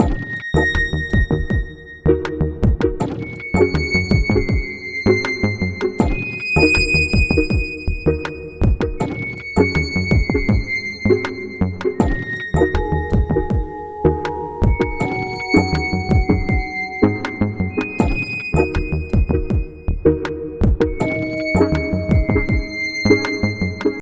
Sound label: stem bridge; Pix the Cat